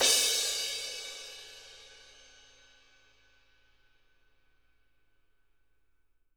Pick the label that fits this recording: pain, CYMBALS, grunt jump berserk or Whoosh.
CYMBALS